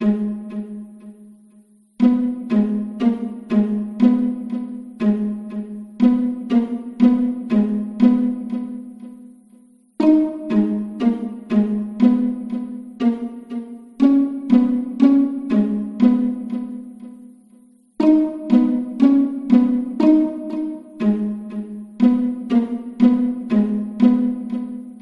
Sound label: slow rise synth